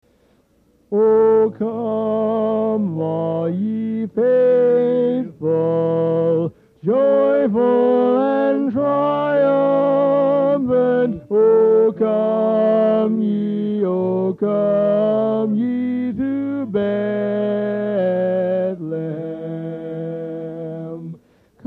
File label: O, Come All Ye Faithful, Lead, Chorus